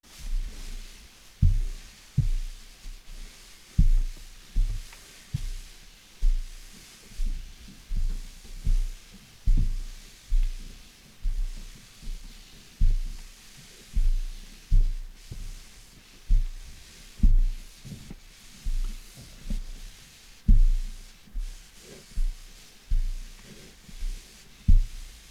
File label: crawling